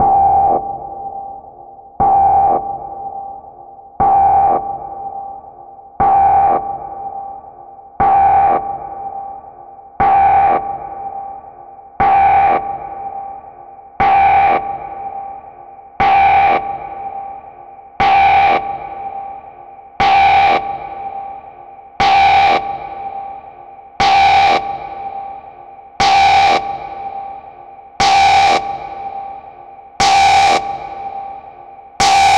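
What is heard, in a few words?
Alarm (Distorted, Evolving)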